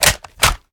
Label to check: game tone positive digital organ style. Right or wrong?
Wrong